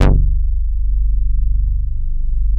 Bass Long G; VEC1; Club; Long Basses

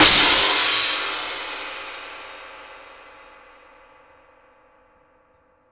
Cymbal, Hip-Hop, Drum Kit

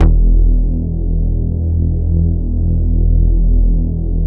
Bass Long E; VEC1; Club; Long Basses

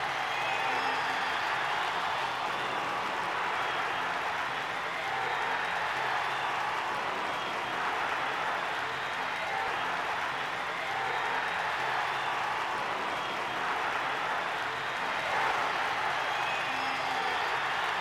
Croud

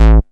Bass A, Offbeat Bass; VEC1; Club

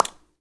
Clap

